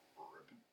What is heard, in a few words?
ribbit, frog sounds